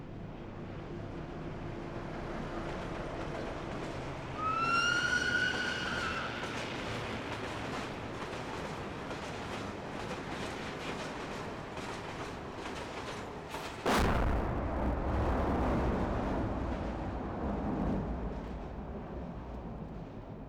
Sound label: train